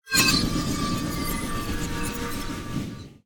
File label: atlas charging; smite; god atlas